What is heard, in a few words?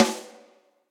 Snare Rim Cold Tight Room; Drum Hits; Standard Hits; Ableton; Factory Packs; Session Drums Studio